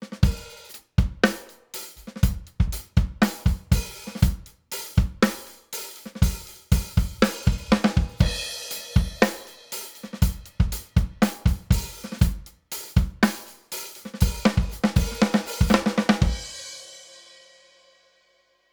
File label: Drums Slow SC 110 Hz; Rockruepel Comp.Two V2